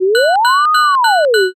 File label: firetruck